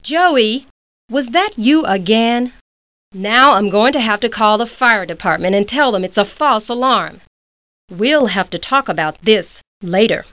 holler

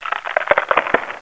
bridge crack; NETSTORM

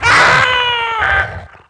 gynosphinx die; DAoC; Toa; Gamestar

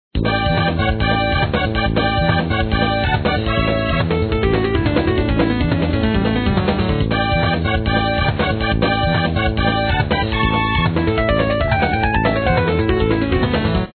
BGM SAMBA; POKEMON

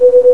shield; Battle for the Universe

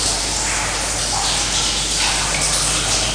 Cave Drip; Nightfall

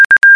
Win tones; ueiBlackJack

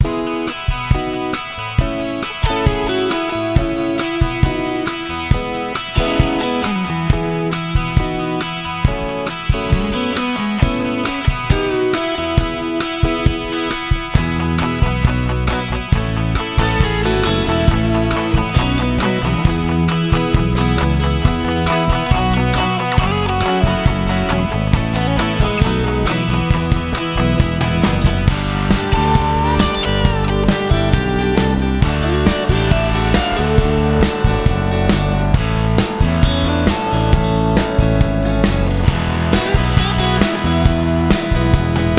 THEME LOOP; PUZMANIA

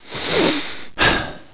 breathing; Widget Workshop; A Mad Scientist's Laboratory (1996); Maxis

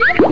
plop, cartoon

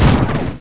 MINE DEA

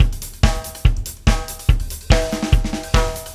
Sly Dance, Rock Drums Loops; ACID MUSIC

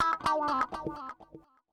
ELECGUIT, HOUSE, 125, A; eJay; Guitar

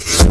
crossbow; Odyssey

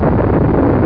rumble

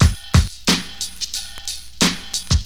Drum loop, HipHop; LiveAct Pool 1

